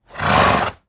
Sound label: Animal Horse Snort; animals; AdobeCC